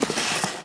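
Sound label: Wood Slide Medium; objects; Star Wars Obi-Wan; XBOX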